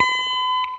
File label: Guitar & Bass; Quantum Leap